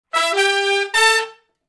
Shuffle Blues Riff (A) high unison, Horncraft for R&B, Sections